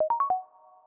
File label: Main menu move, Audio User Interface; samsung YP-U5